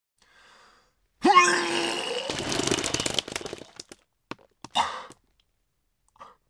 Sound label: vomit